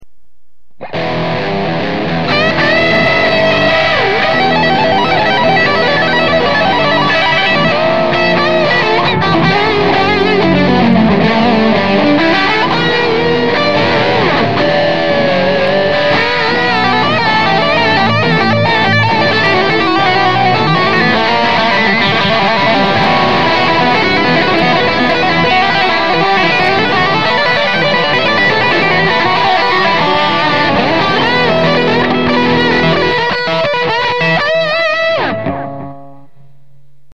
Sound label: solo guitar